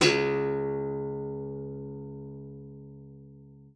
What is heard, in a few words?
C#2, GUITAR; Xsample VOL-2